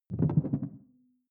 sci-fi alien bounce; swooshes-swishes

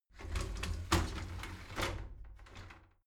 shower slide door inside open soft

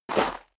step high heels gravel medium jump; schritte; Footsteps; menschen

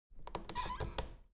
door plastic handle pull up creak soft

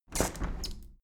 window handle plastic squeak close regular; objekte; fenster

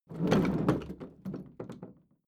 roll smooth; haus garten; wohnen

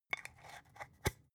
spice jar scratch tonal close soft; industrie wirtschaft; gastronomie

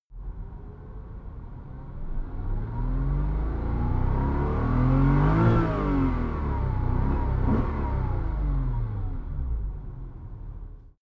sports car porsche boxster rev interior; verkehr transport; autos

